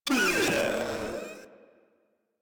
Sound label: Proto Infested Runner Pain; Warframe